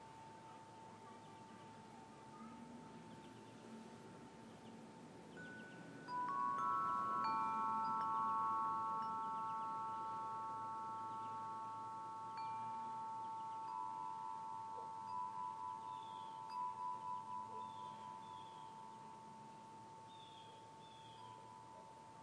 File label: wind chime